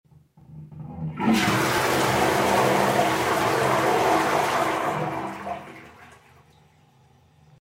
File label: flush; flush wave pool project